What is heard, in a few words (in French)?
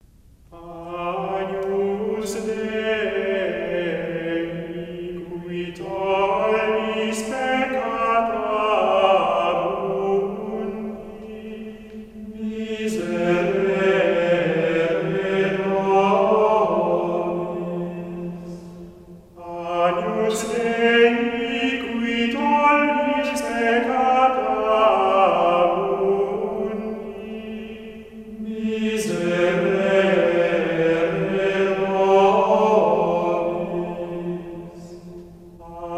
Agnus Dei Choeur Gregorien; Choeur Gregorien de Paris